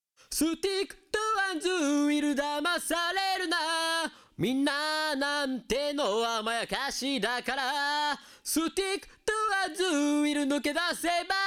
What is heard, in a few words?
Vocal